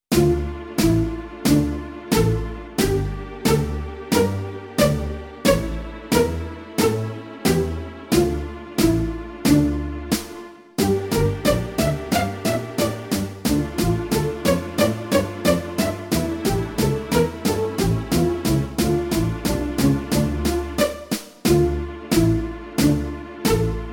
bouwmuziek langzaam en snel

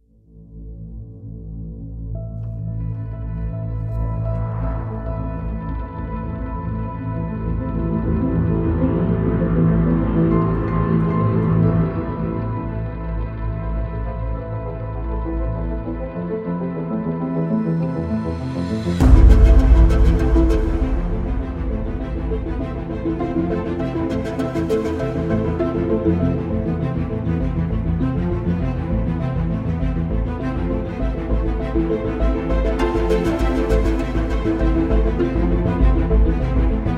futuristische muziek

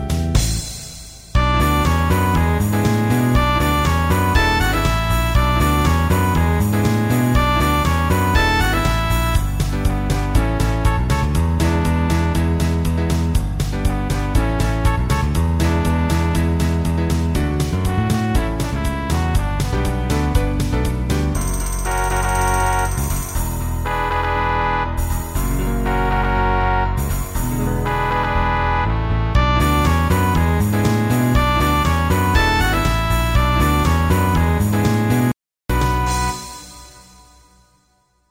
karaoke versie

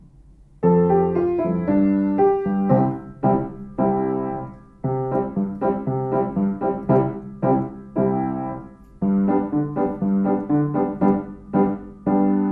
karaoke versie

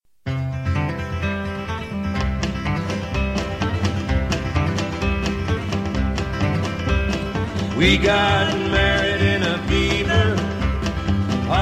cowboymuziek

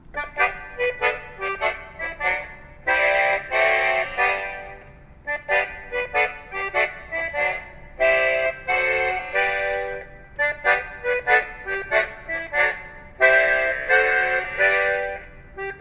instrumentale versie; ik stond laatst voor een poppenkraam

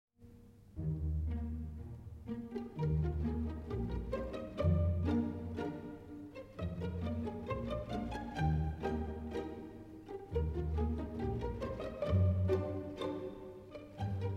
pizzicato polka gedeelte; bouwmuziek 3; Let op: dit is geen muziekstuk met een vaste hartslag. Je kunt hier meegaan met het ritme van de viool.

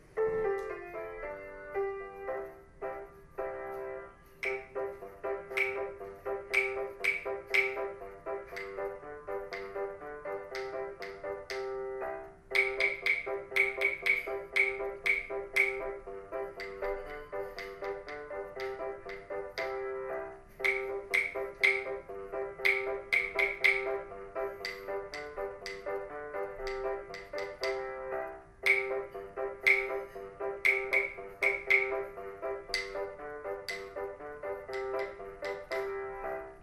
oefenritmes improvisatie deel

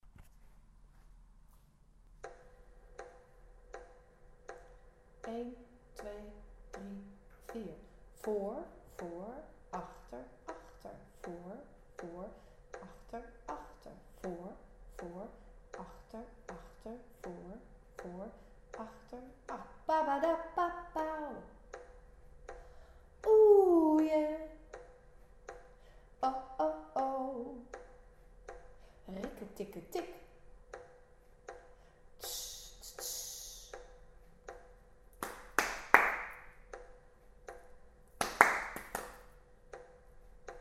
voorbeelden echoput; muziek onze stad groep 3 4; Energizer ´Echoput´

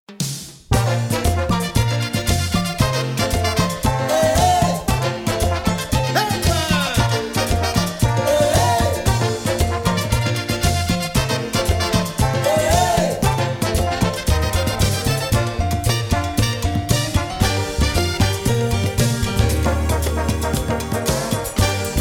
bouwmuziek braziliaanse muziek

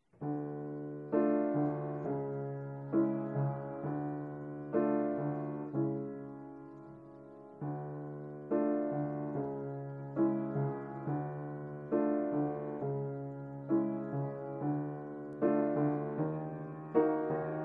lied karaoke versie; muziek verhalen groep 3 4

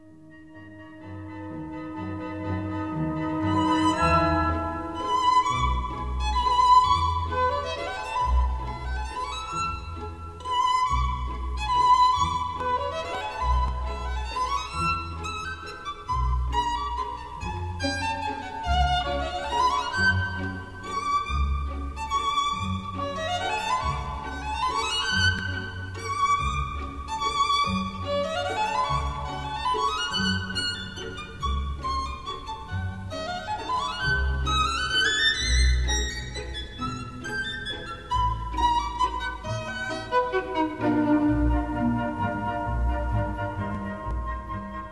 dansmuziek klassiek

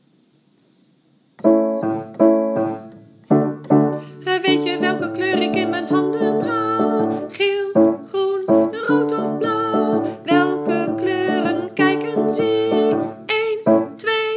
lied kleuren gezongen versie

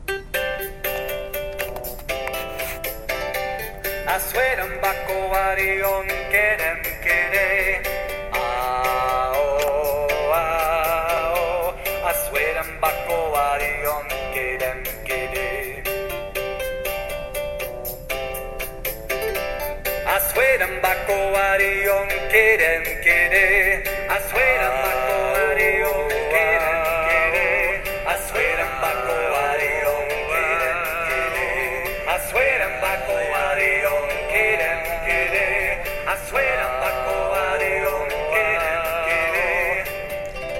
gezongen versie; congo boat song

